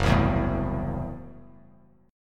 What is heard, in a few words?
F sharp Minor 7th Flat 5th F sharp Bass Standard; strummed; JGuitar